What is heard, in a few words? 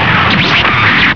GEAR; VERITECH